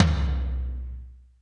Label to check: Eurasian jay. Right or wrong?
Wrong